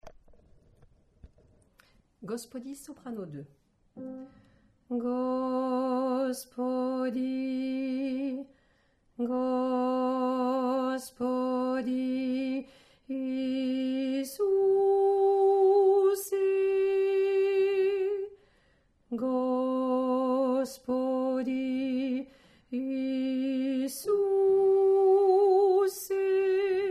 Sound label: Soprano